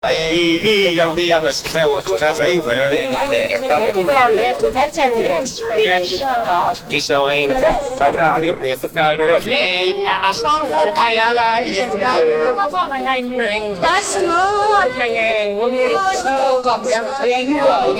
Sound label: a kpop girl group at